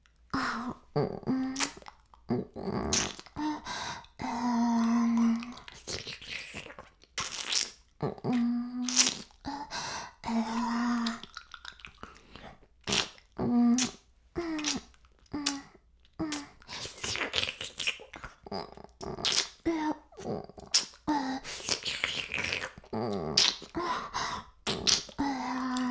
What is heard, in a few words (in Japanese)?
耳舐め音声; 白くて黒い魔性円環